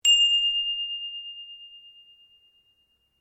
Bell Handbell Priest Japanese Ring Long, Japanese priest bell